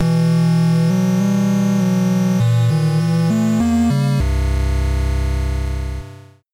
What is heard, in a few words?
Angry Save; Infernax; PS4; Xbox Series X/S